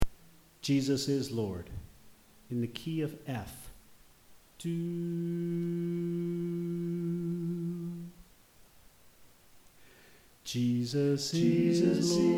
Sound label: Other Echo Part Left